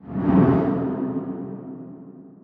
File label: ui fade; Opus Magnum